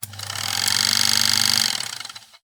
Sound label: film projector vintage; soundbits soundfx